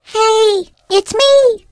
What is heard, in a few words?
turret shot at